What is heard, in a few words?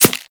Arrow Impact Flesh; Warframe